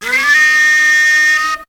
FLUTE SCR; BEAT2000

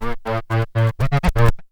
L SCRATCH; BEAT2000

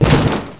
SAND

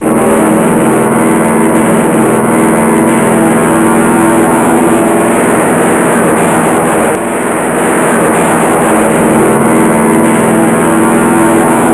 aircraft, pby; warbirds

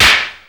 HIGH CRSH; BEAT2000

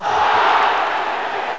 OOH

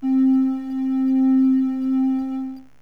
JUPITER, SYNTH; JUPITER6; cubase; CUBASIS

